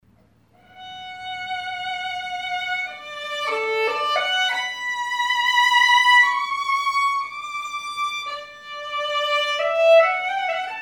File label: Meditation from Thais, Vuillaume